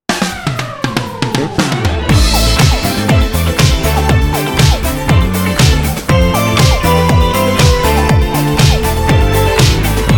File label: Disco Spins; Flaming Disco; Slot Tunes